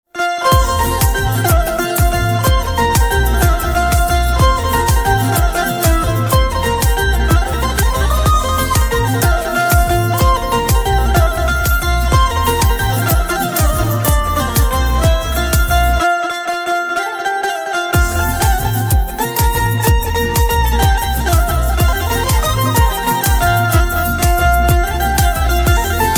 Banjo Instrumental Ringtone, punjabi instrumental ringtone